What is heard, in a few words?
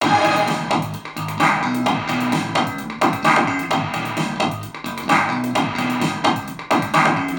MUSIC BEATZ LOOP BOOMBOX; Lego DC Super Villains 2018 Nintendo Switch